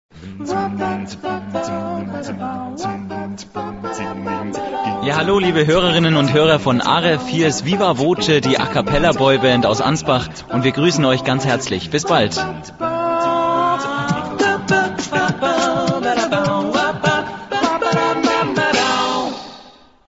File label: jingle